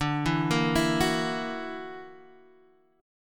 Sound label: F sharp Augmented 7th D, chord